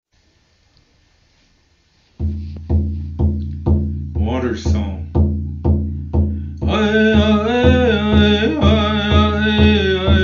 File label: Water Song